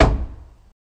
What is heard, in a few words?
Staff Hit Single, spears & staffs